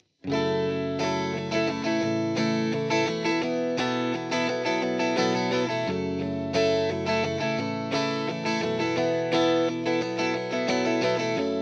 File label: lespaul, JCM800 hot; mercuriall